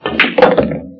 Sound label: pool shot